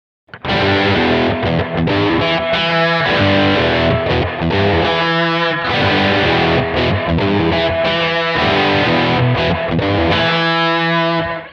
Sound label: reflections; BigSky; reverb; Bubba Bad